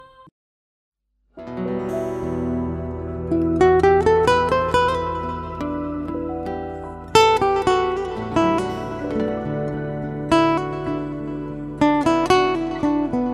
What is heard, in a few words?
bengali ringtone